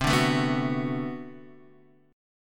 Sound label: B-Minor 7th C; Bmin7